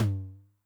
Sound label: Noise Med, 909 LOW TOM